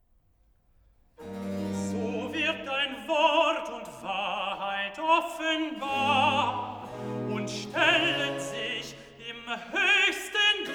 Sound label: Recitativo; Cantata, BWV 126 'Erhalt uns, Herr. bei deinem Wort'; Bach; Southwell; Sexagesima